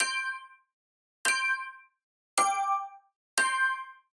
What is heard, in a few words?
Digital Click Neutral; boom library; The Interface